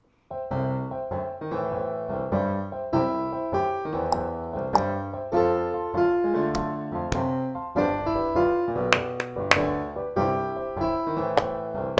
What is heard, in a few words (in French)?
instrumental